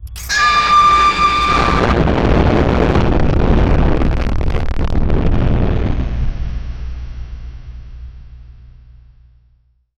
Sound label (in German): furzen